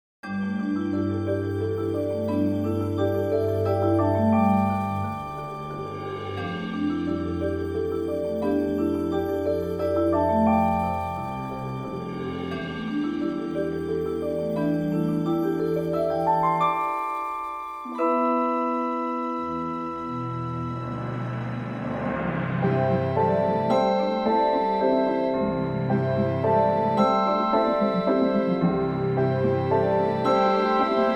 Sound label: synth audio